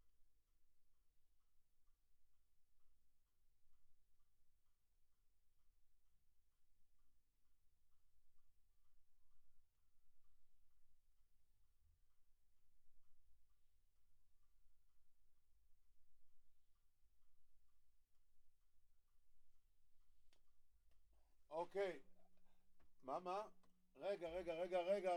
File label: Kit